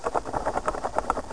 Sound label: run; Bible Fun Pack; Education Publishing Concepts; NOAH